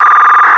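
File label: Motorola MDC Preamble, radio effect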